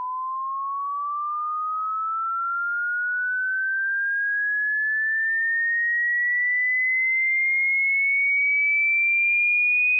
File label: tone sweep